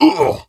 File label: VO Glutton Wound; battlerite